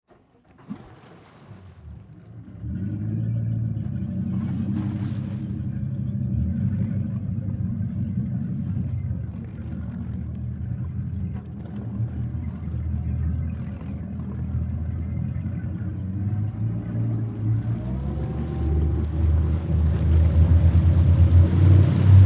Boat Start Run, High, Speed, Off, Dual, Engines, Deck, Perspective; Transportation, Watercraft; Police, Marine, Division, Cherokee